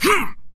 Melee Grunt, Human Male; zps; HumanMale-01; MeleeGrunts

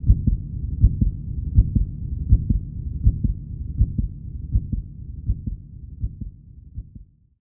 Heartbeat 80; zps; Foley

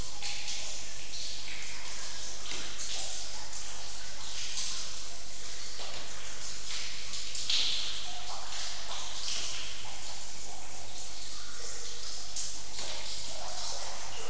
Cave Interior; zps; Ambient

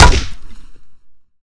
Bat Hit, Melee; zps; Weapons

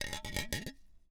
Grenade Roll; zps; Weapons; Explosives

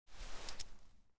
Grenade Holster; zps; Weapons; Explosives

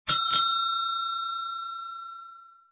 counter bell; zps; necrotales

